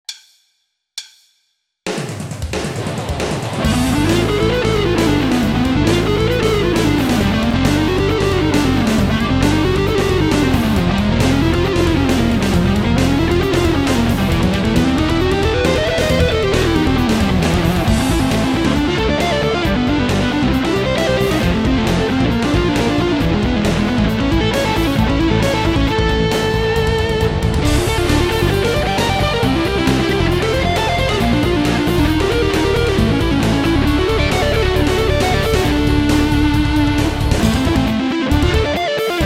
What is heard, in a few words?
Triads